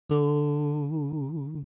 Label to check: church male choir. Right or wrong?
Wrong